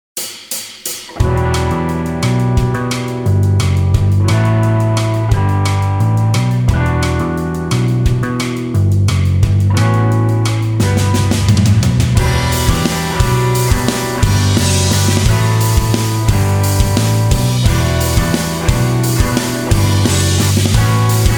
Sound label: math rock guitar